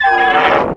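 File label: droid assassin hit; Star Wars Knights Of The Old Republic I; XBOX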